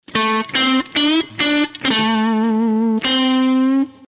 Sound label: BLUES LIK